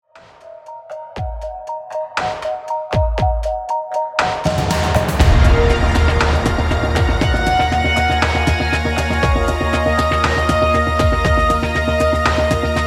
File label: Groove